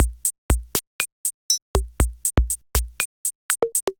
C78 Kit, Drum Machines; C78 Bullet Kit; Ableton; Factory Packs; Drum Essentials

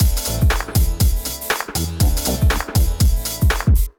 Kit, Drums; Cooked Kit; Ableton; Beat Tools

